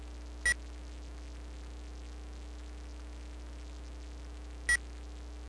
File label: beep